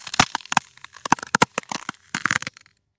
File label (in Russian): tonkiy ld hrustit pod nogami